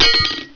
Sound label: hit